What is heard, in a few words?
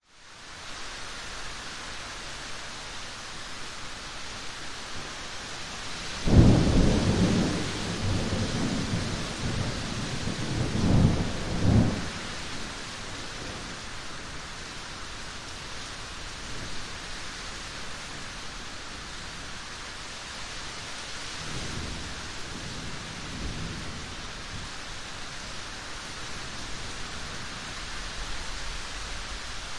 nature thunderstorm rumbles and distant cracks thunder rain decreasing; towards end